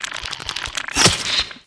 crag crab attack, monster

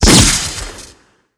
skill explo

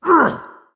wizard damage, monster

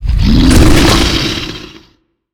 golem; monster; boss

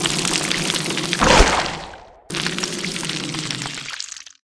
temple gate death, monster

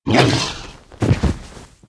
stone dead

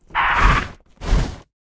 moscutter queen damage, monster

